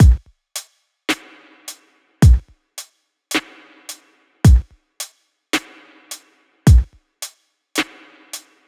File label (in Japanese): lo-fi ビート